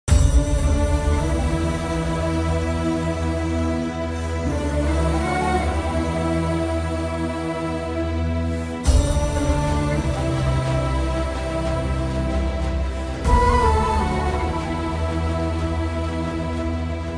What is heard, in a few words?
emotional bgm; acharya